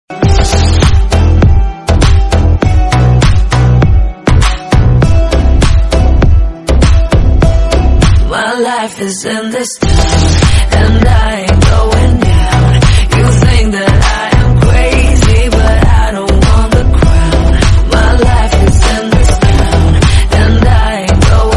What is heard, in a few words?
bgm